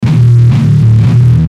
Musical Mangle, Musical Mangled Guitar Overdrive Rhythm; Guitars; Blastwave